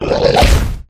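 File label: lizard middle; yasher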